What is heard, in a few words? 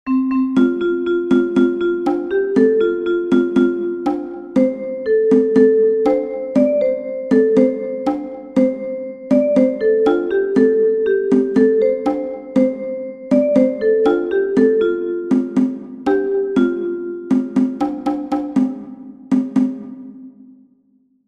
Cajon; Mister Rabbit